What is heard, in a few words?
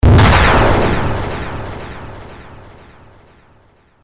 explosion, Undertale